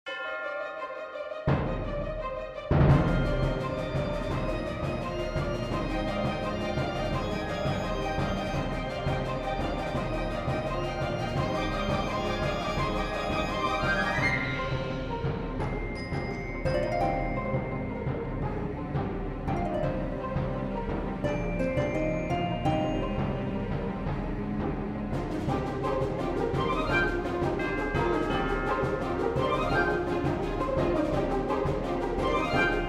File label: Battle (Orchestral); Toontown: Corporate Clash Soundtrack; Cogs; Count Erclaim